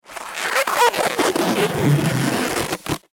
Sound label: Foley Balloon Rub Long; Balloons; Cartoons